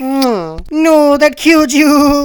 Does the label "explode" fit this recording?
No